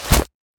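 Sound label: Power Small; smite; god bakasura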